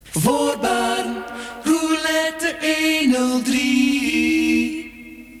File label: silence